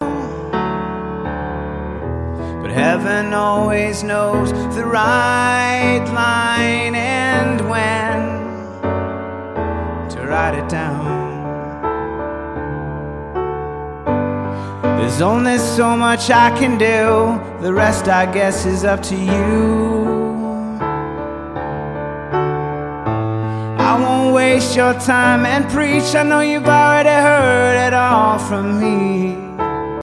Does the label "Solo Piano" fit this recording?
Yes